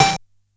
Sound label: Bent Snare